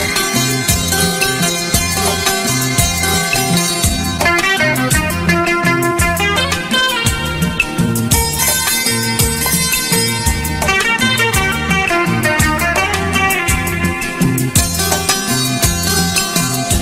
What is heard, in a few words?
Instrumental Tone